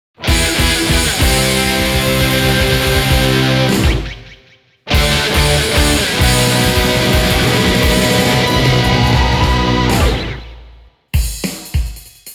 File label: Classical Medley Rock Version